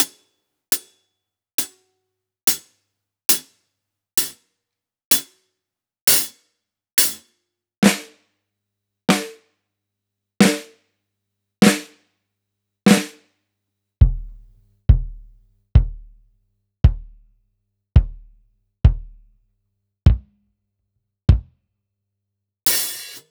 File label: Acoustic close, Kit